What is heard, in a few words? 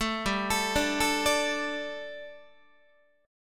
G Suspended 2nd G, down, Guitar; Gsus2 arpeggiated; JGuitar